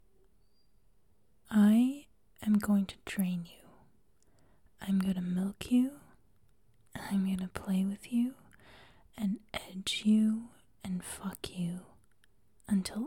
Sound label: Moaning; [F4M] Draining your balls; [JOI]; [Teasing]; [Edging]; [Multiple Orgasms]; [Long]; [Countdowns]